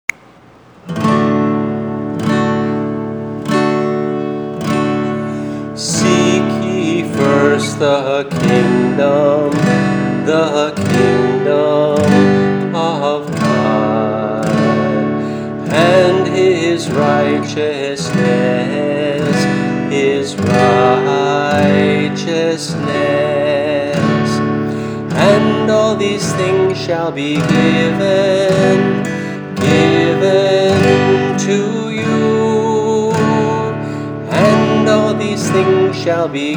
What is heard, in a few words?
seek ye guitar